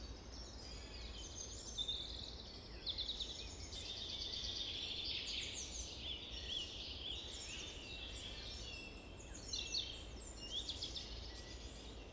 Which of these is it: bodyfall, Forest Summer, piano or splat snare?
Forest Summer